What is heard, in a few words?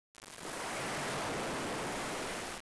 sea wave low; theodyssey